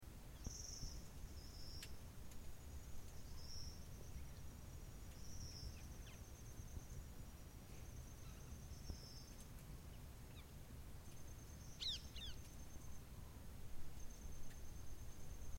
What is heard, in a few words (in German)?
urwald atmo; bangladesch 2009